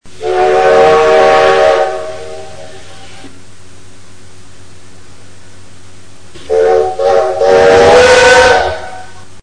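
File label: signal teplovoza, diesel locomotive signal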